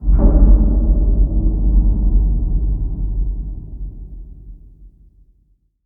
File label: Random Subterranean